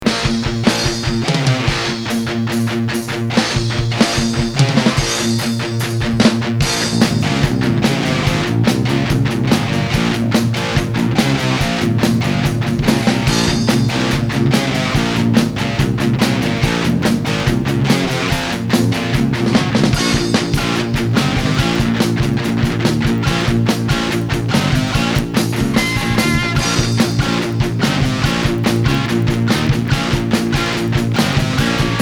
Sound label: instrumental